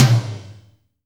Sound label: TOM, Drum Dry; East Collexion; Drum 1